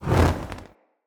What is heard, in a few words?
Player Torch Whoosh Fire; Weapons; Blunt